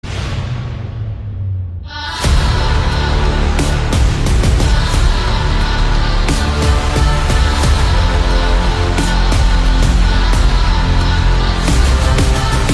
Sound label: Trailer Music; Black Adam